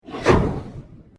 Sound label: PULSE SHORT SWEEP; Sound effects created by manipulating other sounds; Sweepers; Sound Design